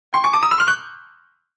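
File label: Grand Piano Fast Comedy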